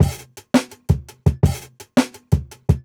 168 Dry, Jungle Breaks; Original Jungle Breaks

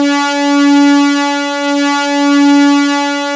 SYN ANA, Dance Synth; Sound & Vision - Gigapack I CD 2 (Roland)

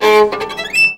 VIOLIN LINES; Best Service; World Colours

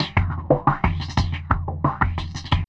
Filter Loop, Drums & Percussion, Electronica

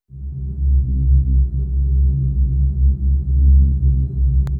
atmo, FX; Ueberschall; Techno Trance Essentials

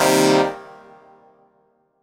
Dance Mega Synth; Best Service; 90 s Sample CDs

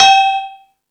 Percussion, Drum Machines

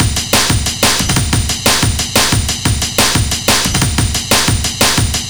amen; Amen Breaks

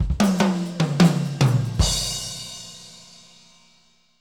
Fills, Monster Beats; Zero-G

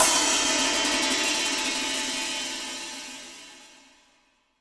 Crash, impact samples; Crashes

